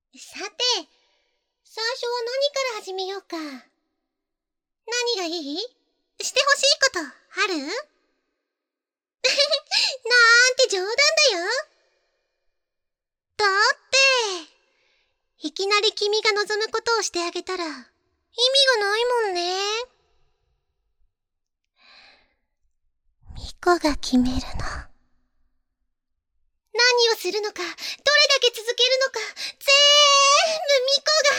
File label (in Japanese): 耳舐め, ASMR